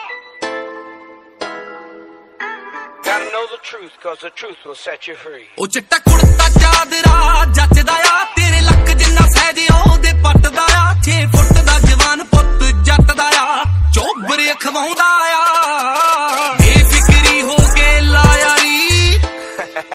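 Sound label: english punjabi remix ringtone; english ringtones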